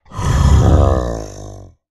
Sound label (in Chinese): 待机声; 劫掠兽